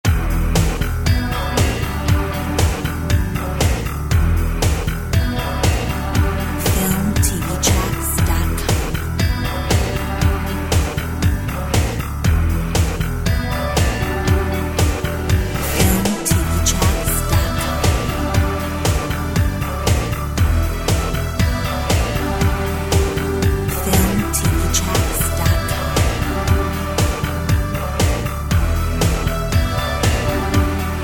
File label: Mountain rock